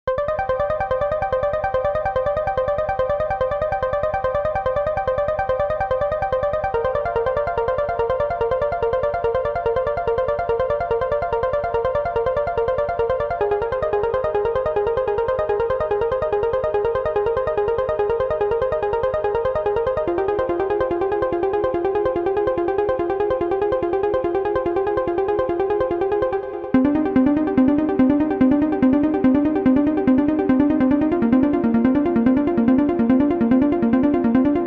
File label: Tronator Arp